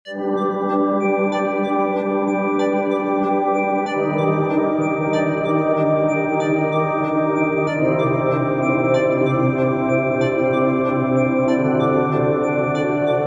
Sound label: fairy tale loop